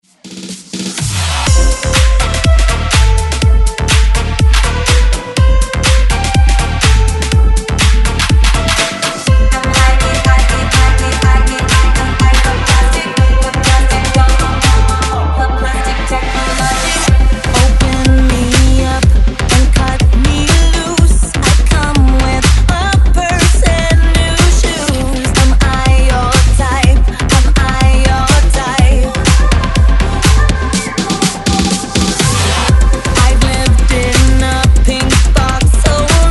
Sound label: Dance Remix